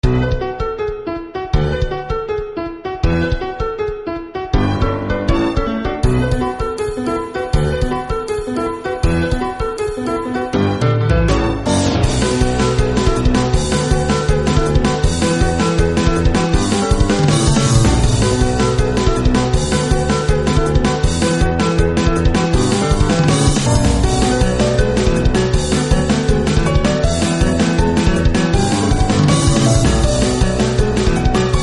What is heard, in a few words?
Piano Sketch